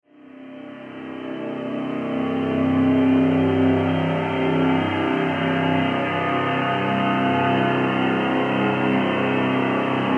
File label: eye surgery box